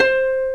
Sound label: HARP KARPLUS